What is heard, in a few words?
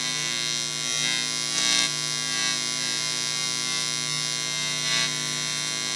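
ambient electric buzz loop; Half Life Model Pack; Half Life Alyx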